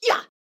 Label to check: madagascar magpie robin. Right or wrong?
Wrong